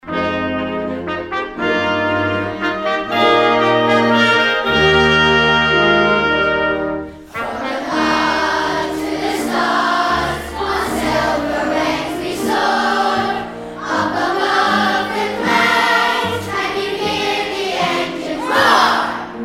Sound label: chorus